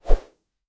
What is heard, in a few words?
transition